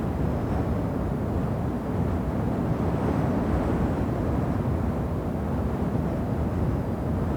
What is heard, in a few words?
ui player grab spell; Shelter